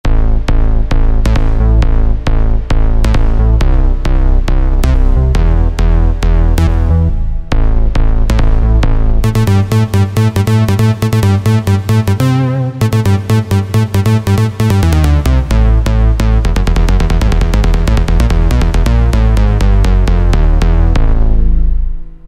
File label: BASS